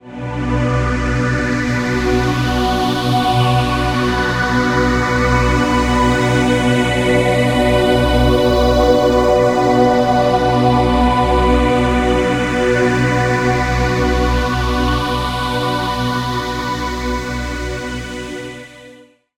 heaven synth chord phrase, Ethereal Ambient Samples; Bluezone